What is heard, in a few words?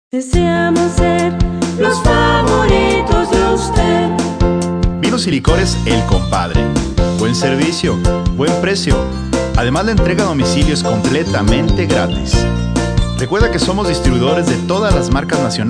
jingle; el compadre